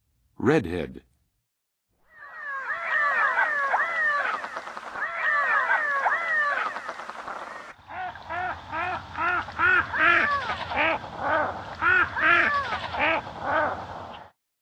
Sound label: Redhead; North America; Western USA; Western USA Songbirds